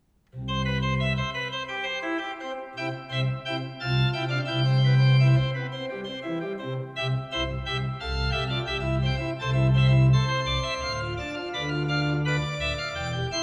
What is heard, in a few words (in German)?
Trio C Dur; Bach